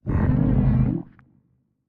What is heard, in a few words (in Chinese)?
监守者环境声